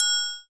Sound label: triangle; Triangle Kit; ETHNIC and WORLD PERCUSSION